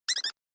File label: Cartoon Squiggle Sound; Andrew Fleming